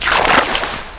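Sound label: water under; terror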